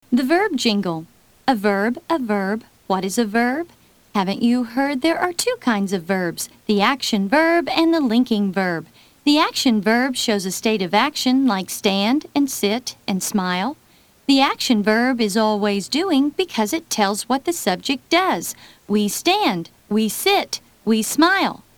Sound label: verb jingle